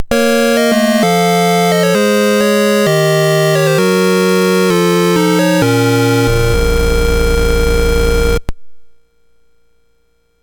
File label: Dragonworld sid MOS6581R4